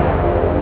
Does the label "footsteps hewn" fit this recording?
No